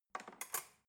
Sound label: cutlery knife drawer handle rattle